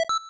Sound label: Purchase from item stand; mother2